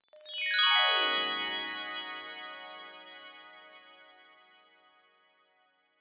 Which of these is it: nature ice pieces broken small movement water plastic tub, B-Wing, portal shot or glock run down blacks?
glock run down blacks